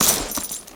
Skell Soldier Cloth

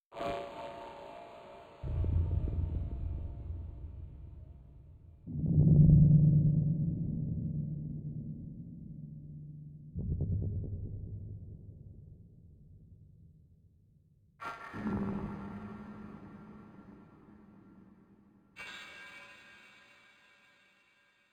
Music Hub Layer erosion disto; Music Hub Layer erosion disto 10

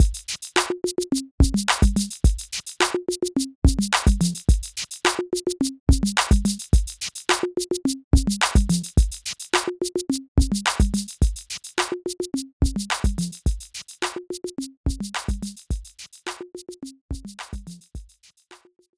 Music Frontend

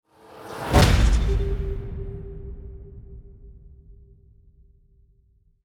Music Perc Trans Race end sweetener

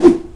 Jumping

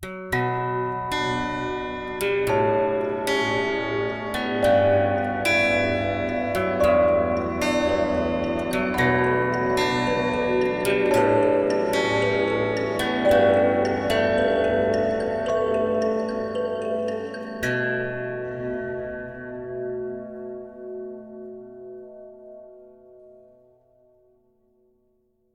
campfire resting sad; curious expedition 2 macos ps4 switch windows xbox one xbox series xs gamerip 2021

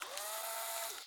Camera-Broken Print

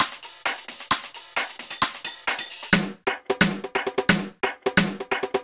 Triplet Drums fast; Rhythm Doctor

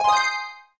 coin; Night Hunter After Dark Chapter II

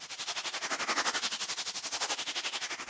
Big Buzzer Flying Loop; gamerip

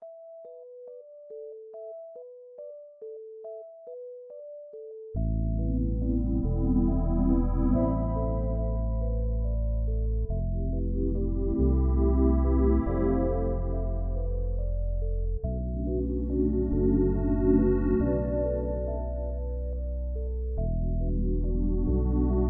music (aquarium flashback calm); aquarium flashback 3 calm; unsighted

